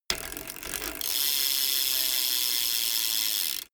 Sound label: bmx bike pedal once freewheel long